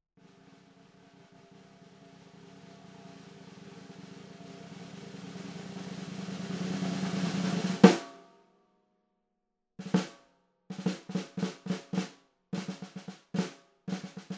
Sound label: Snaresolo